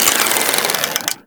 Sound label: Hunting Bow Draw; Weapons